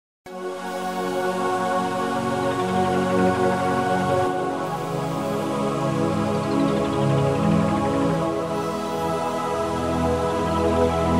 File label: Piano Waves